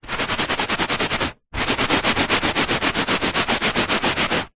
household cleaning wiping carpet with dry tissue paper fast wipes; zapsplat